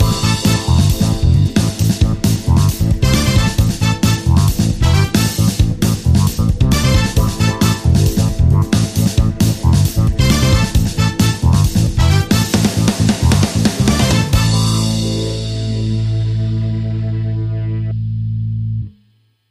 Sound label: Funky Soul Cats